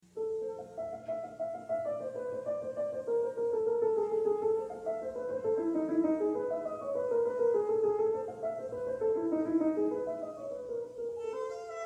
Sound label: Sonata in F minor